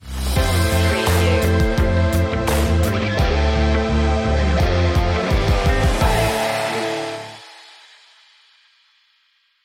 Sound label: stinger, swanky